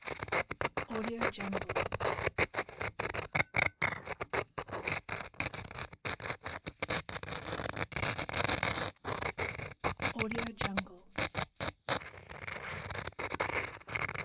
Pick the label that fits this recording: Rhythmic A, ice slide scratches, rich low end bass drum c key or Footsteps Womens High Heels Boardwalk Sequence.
ice slide scratches